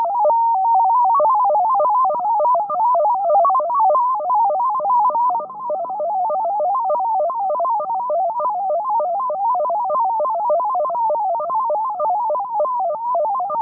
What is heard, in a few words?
MFSK-20 AM EOM